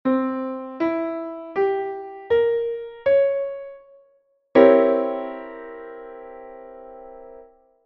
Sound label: C7♭9